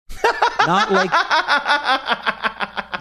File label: having good laugh